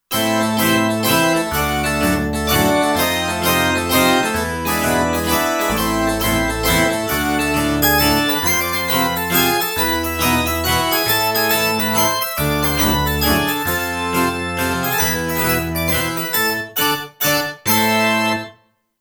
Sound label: Modern Harpsichord, Kurzweil K2500X